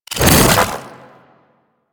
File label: Jump; Warframe; Corpus; Arachnoid Wraith; Abilities